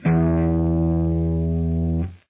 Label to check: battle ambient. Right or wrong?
Wrong